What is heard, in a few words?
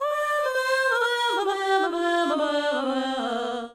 Fem Vocal 128bpm G FX, African Voices